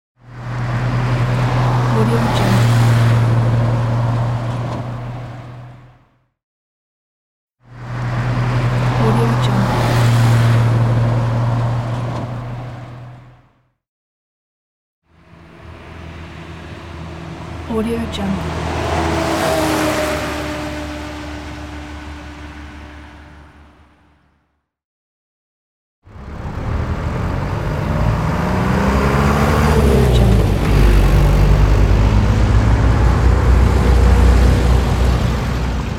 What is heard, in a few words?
truck pack; sound food sfx